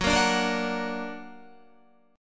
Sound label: Gb Augmented Guitar; 13,13,14,15,14,14; Gb+ strummed